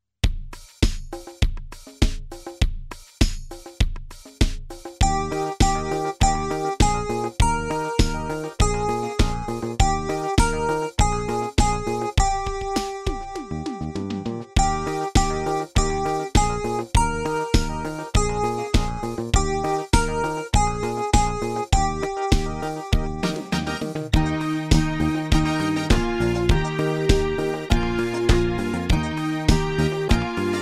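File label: Au Clair De La Lune Duet Faster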